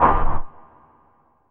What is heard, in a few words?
CLUNK; BEAT2000